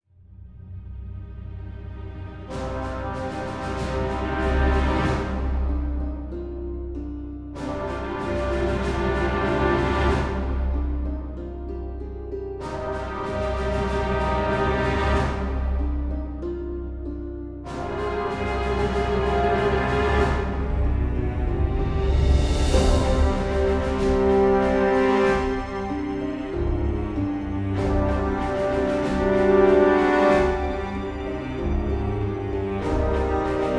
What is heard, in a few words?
Music Epic; A Year of Rain; Lord Rupah Epic